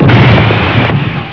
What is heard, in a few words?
CRASH